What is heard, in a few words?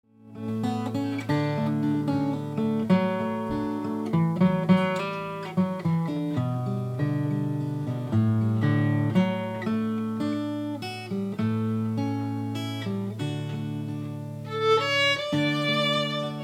fiddle